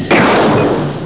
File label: fire; amiga; gloom